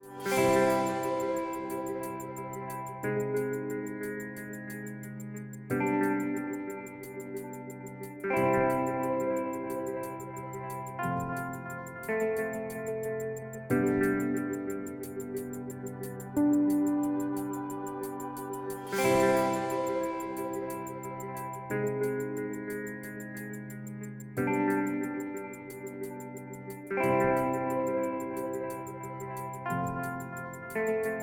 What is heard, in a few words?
Simple guitar Loop; bakugan champions of vestroia 2020; switch gamerip